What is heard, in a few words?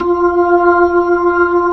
B-3, Jazz; Roland LCDP10 Keys of the 60s and 70s 2; Jazz Ch 16